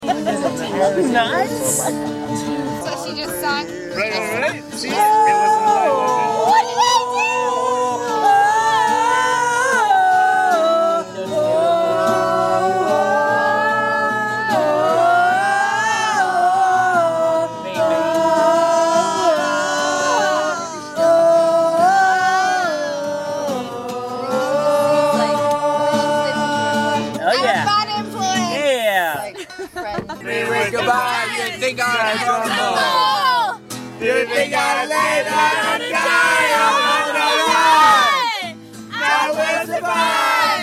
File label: drunken singing